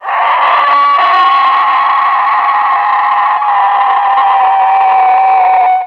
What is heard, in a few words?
Raptor Scream